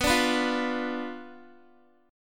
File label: B Diminished B down Guitar; strummed; tuning 4F,4A,5C,5G,5C,6E